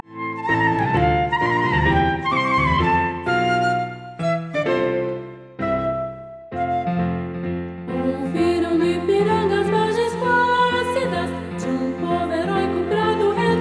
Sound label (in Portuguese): Hino Nacional